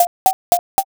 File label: ladder